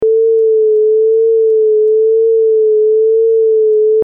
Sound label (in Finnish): vibraatto puolisävelaskel 01Hz